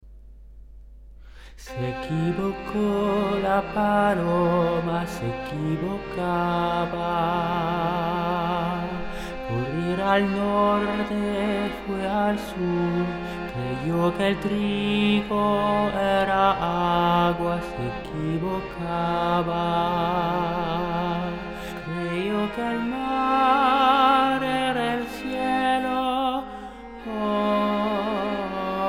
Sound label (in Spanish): se equivoco sopranes